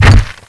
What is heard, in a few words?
strike